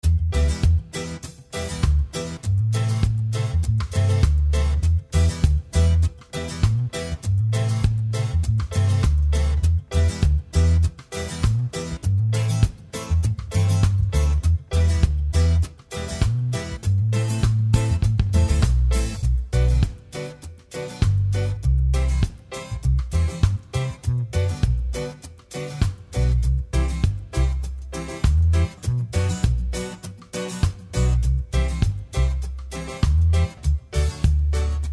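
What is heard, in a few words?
Guitar, Acoustic, Reggae Strum Even 16 4-4 90bpm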